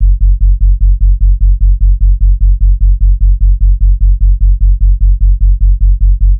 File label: Sub Loop F#; Loops; Ghosthack